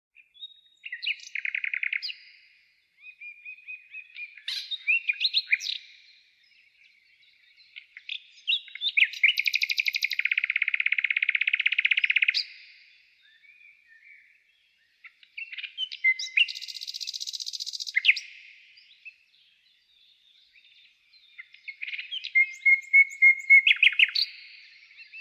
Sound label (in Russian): zvuki prirody vesna ptitsy; vesennii sbornik